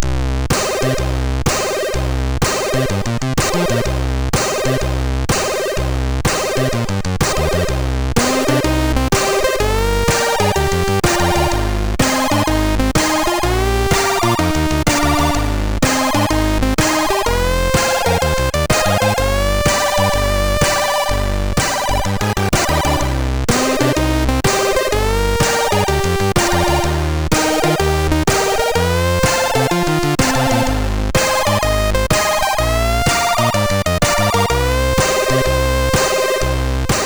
Metal Warrior Title Remix, sid MOS6581R2; hvsc

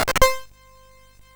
sid MOS6581R4; hvsc

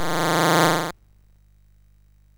sid MOS6581R2; hvsc

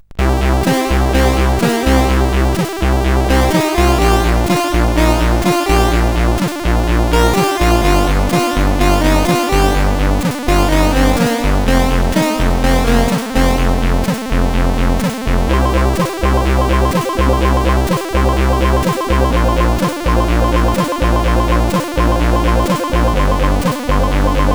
Game Music sid CSG8580R5; hvsc

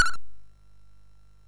sid MOS6581R3; hvsc

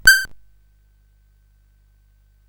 sid CSG8580R5; hvsc

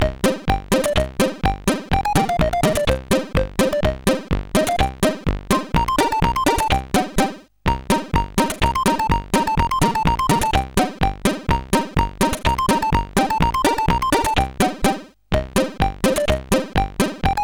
Angry Birds Short Sample, CSG8580R5; hvsc; sid

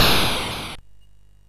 sid MOS6581R2; Gryzor; hvsc

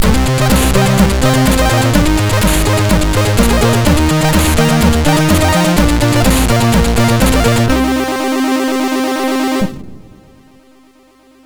sid MOS6581R2; Abyssonaut v2; hvsc

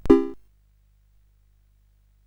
sid CSG8580R5; hvsc

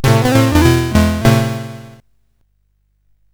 sid CSG8580R5; hvsc

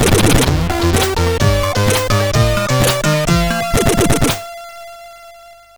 sid CSG8580R5; Attack of the PETSCII Robots; hvsc

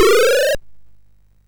sid MOS6581R2; hvsc

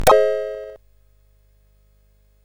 Remix, sid CSG8580R5; hvsc

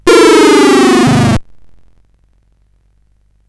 sid CSG8580R5; hvsc

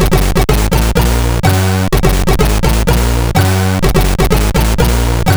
sid CSG8580R5; hvsc

